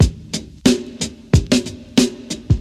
121 bpm breakbeat f sharp key, kick tuned to the F# note. Loudest frequency: 1217Hz; drum loop